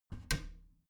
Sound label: bathroom cabinet warped close soft; objekte; tueren